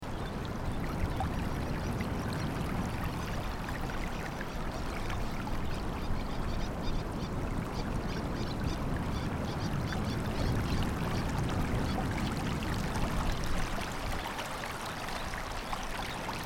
Li wu River Flowing with Ocean Roaring in distance; YuChing Teng; 24°08’10.1″N 121°39’36.2″E